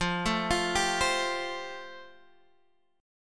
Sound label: F Major Add 9th Guitar Half Step Down